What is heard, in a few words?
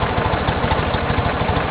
IDLE, Engine; Cars; XBOX; LUIGI